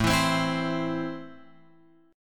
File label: A Minor, Guitar, Open F; Am strummed; x,4,4,7,7,7